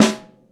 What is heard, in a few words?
Small Snare, Snare One-Shots; KJ Sawka; Drum n Bass & Beyond; Drum Tool Box